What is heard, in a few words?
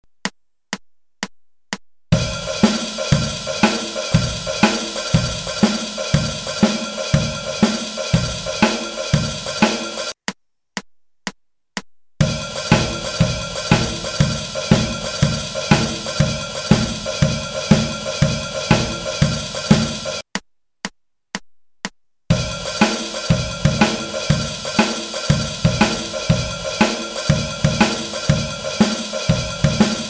shuffle